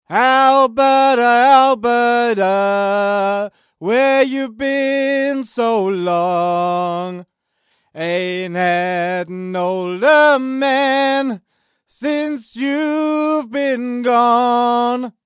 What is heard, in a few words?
Low High Band